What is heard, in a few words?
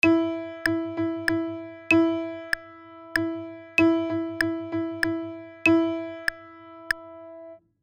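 Three quarters rhythm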